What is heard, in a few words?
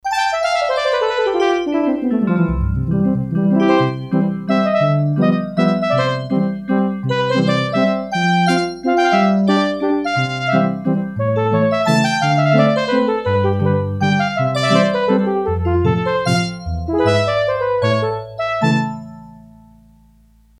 Bell Brass, Korg DS-8; Factory Patches